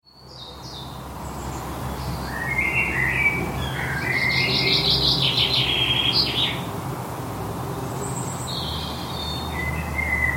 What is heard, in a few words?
birdsong in the amphitheatre, gardens; waterperry